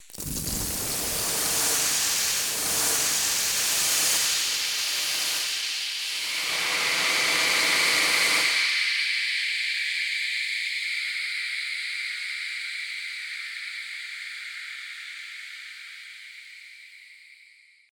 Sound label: Air White Noise